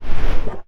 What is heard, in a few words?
Swoop